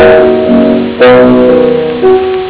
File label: ballad